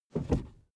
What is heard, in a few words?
suv push forward gear across; verkehr transport; autos